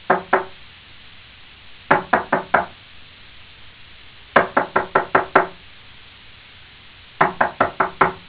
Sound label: knocking